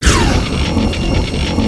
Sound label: beam; RPG Maker; Legend of Heroen v1.20